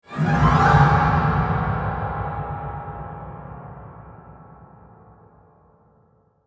player detected screechy; dishonored

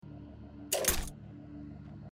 shield; risk of rain 2

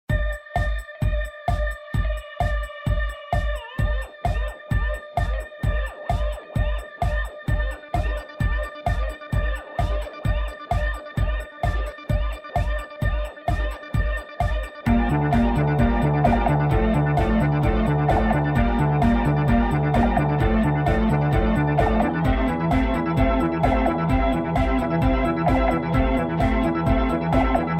hardcore music soundtrack sample

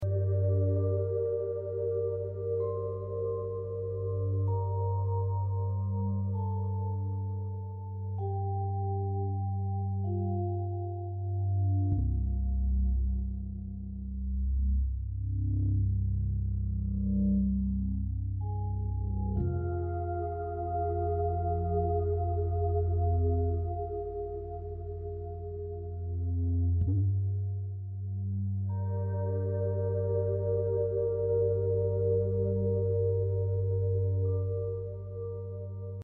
school inside night musik; life is strange

